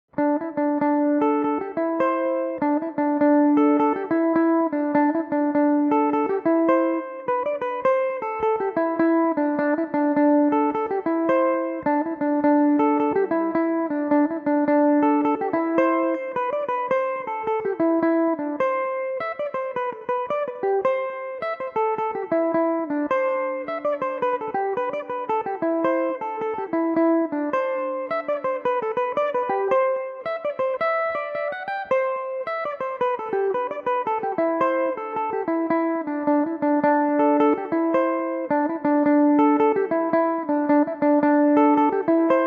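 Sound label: D mixolydian belmuse, irish mandolin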